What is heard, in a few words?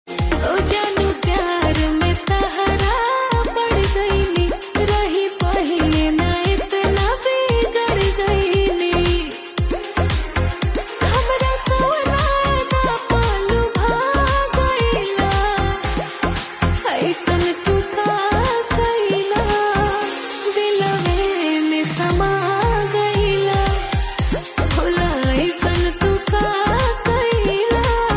Ringtone